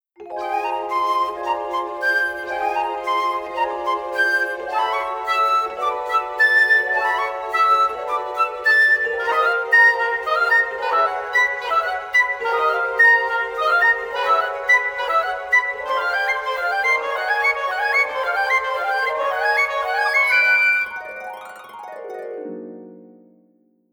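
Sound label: Instrumental; Wizard of Oz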